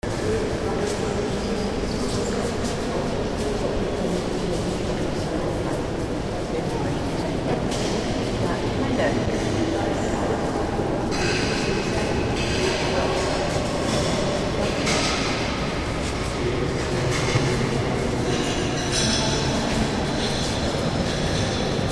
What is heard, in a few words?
atmo germany berlin museum; altes museum